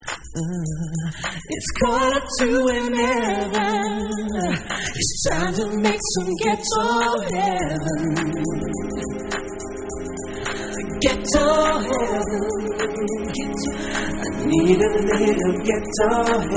Acapella Version

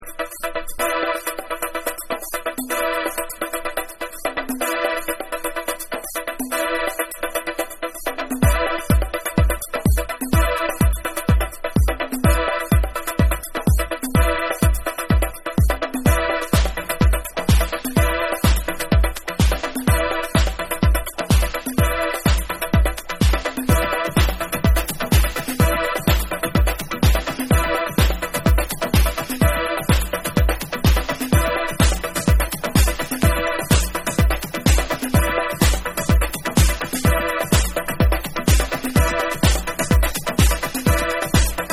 Beat Mix

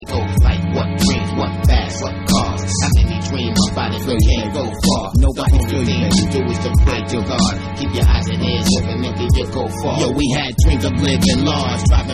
Vocal

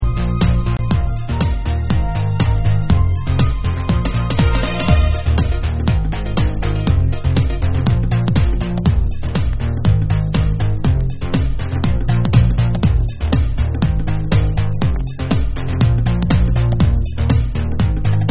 Instrumental Mix

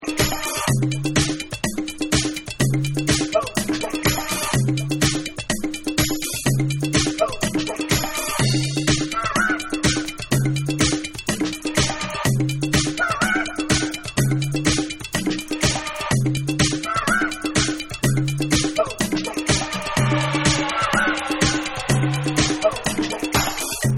Beats

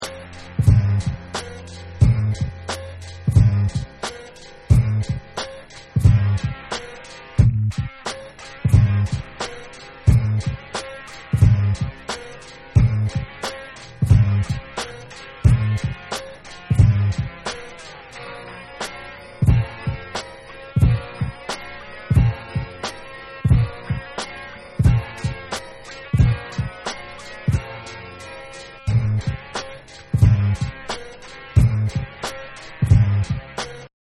Instrumental

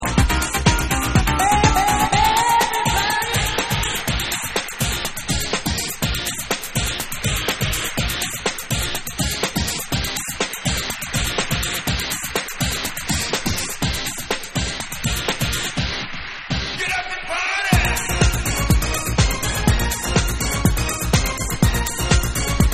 Techno House Mix

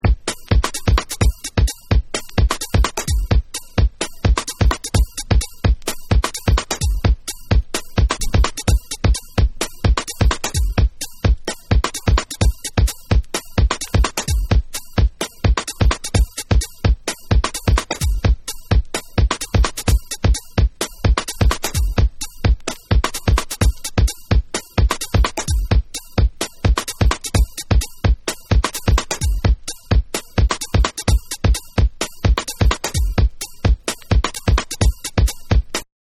Beats

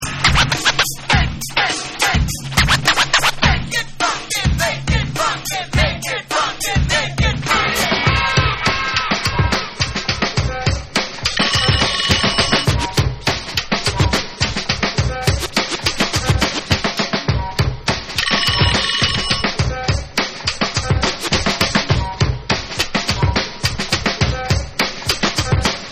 Bass Remix

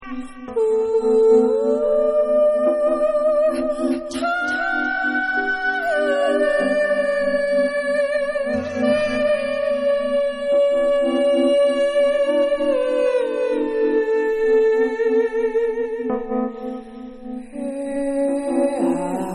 Ambient

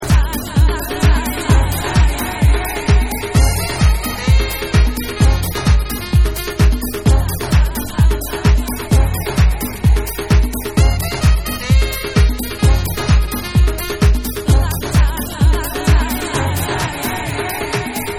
SAXXO

